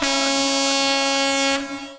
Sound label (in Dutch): tuut; Kermis Jingles Diversen